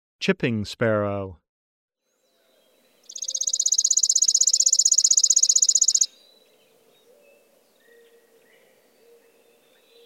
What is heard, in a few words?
chipping sparrow